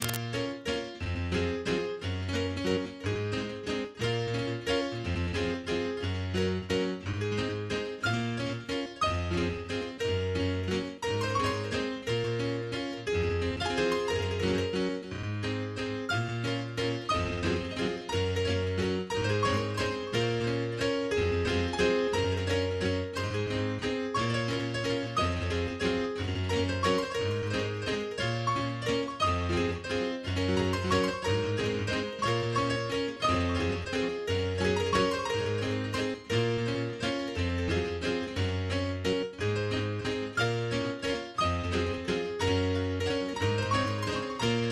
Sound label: relaxing piano music